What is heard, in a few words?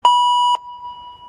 beep